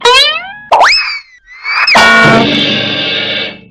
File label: pluck fall